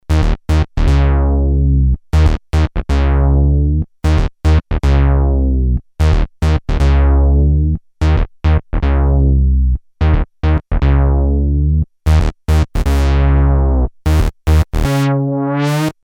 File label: So Juno; BASS; ROLAND SH-201